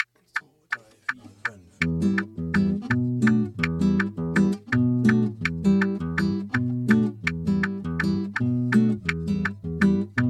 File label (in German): Metronom 165bpm; Git